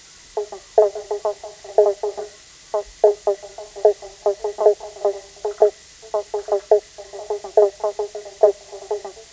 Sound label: bonk